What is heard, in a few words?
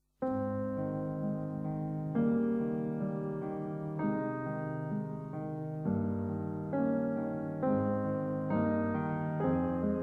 Piano Sonata Adagio cantabile; Beethoven; Piano Sonata No. 8 Op. 13 Pathetique